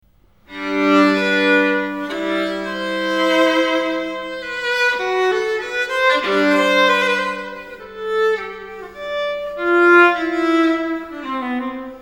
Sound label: viola bach suite sarabande